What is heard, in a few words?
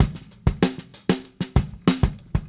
Drums, 70s Analog Funk